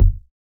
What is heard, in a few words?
Hiphop kick